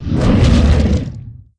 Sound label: Treant idle roar; Nature's Prophet